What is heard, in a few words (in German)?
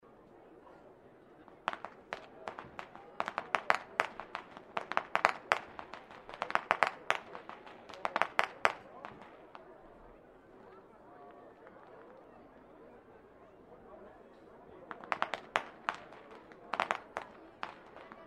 schnalzen; jugend